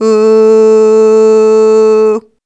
Ooh Short A2 Dry; Harmonic Building Blocks

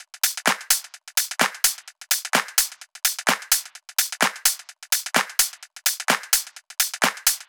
Loops 128BPM, Drumloops

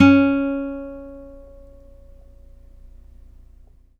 NYLON P C#3, GUITARS; Synclavier Sampler Library

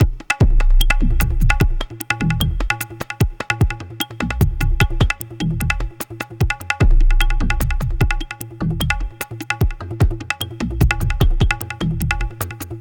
Tablatron, Processed Percussion

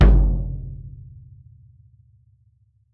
world drums, mixed drums; World Instruments

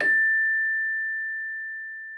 celesta A6; Xsample VOL-8

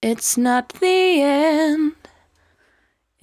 74-5bpm B, Velvet Vocal; While U Could; Zero-G

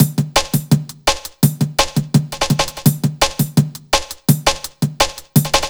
168, Drum Loops; UnkleVibio; M8; Electronisounds

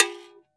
AFRO AGO, AFRIC AGO GO

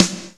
SNR, SNARES; EMX; Crypton - DrumBase5000

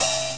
the hihat open; ST-XX Amiga Soundtracker Sample Packs

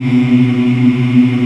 African Mm m; Roland LCDP11 Africa VOL-1

